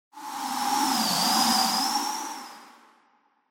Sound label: Wind Synthetic